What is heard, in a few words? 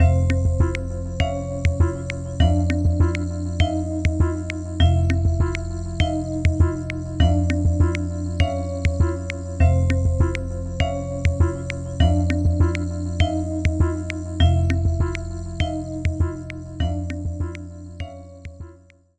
Category Loop; Jackbox Games; The Jackbox Party Pack 1; LIE SWATTER